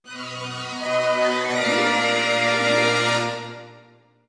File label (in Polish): FANFARY; Szkola Koziolka